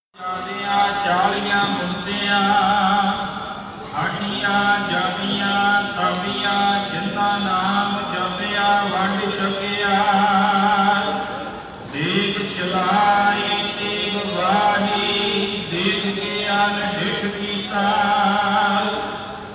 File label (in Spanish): Oracion templo dorado; AMRITSAR